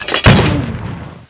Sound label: forest mortar; dod